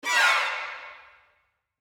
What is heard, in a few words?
short gliss down